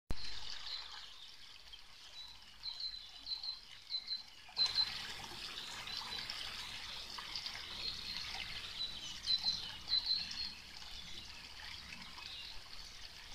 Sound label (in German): Bach und Vögel